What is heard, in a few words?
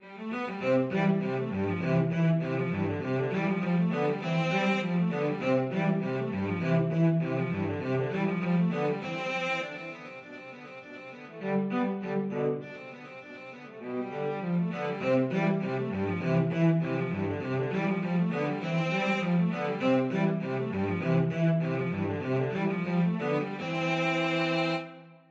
seraglio duet cello